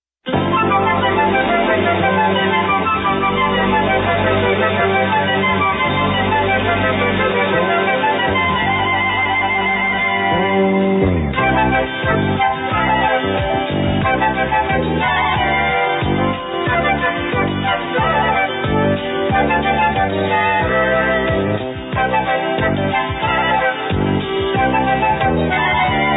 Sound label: music; lection